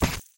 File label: Geo Rock Hit